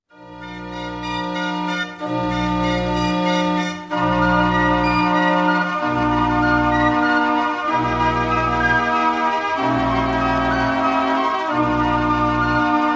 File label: Ave Maria, pansfluiter